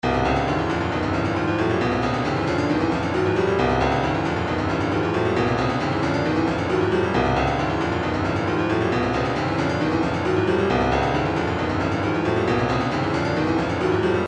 retro funk; lookin good

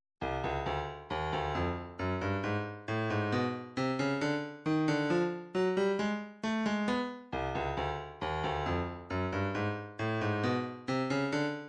135 hihat

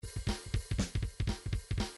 midi grooves pop

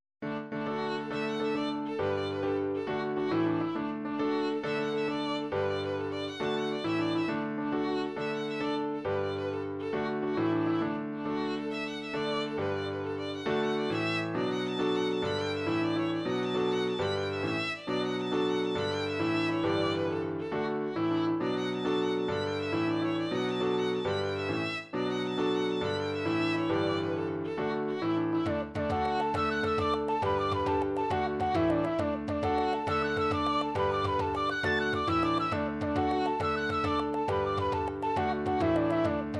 jig; carraroe

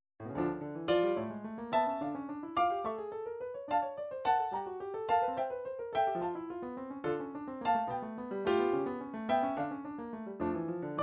studies for piano, midi

